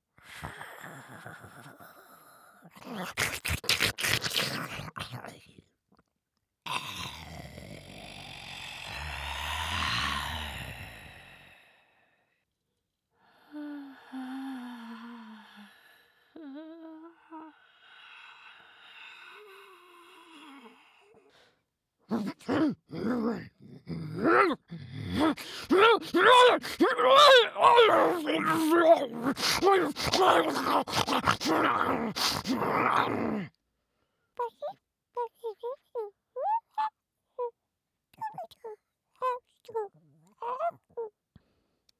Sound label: Creature Sounds